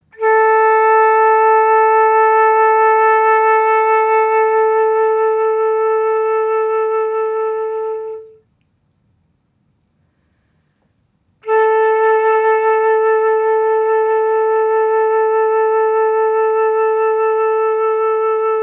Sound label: Flute Tuning